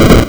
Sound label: Hard PCM Chip; chiptune